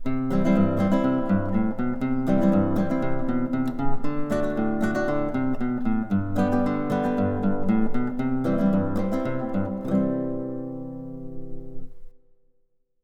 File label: Country Ramble